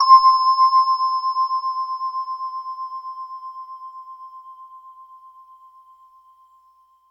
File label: BEL C6, Yamaha TX7 synth keys